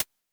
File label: HH Close, Vermona Mono15 Drums Hi-Hats